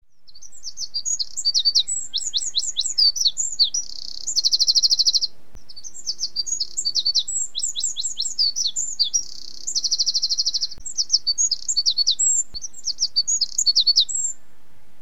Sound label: bird song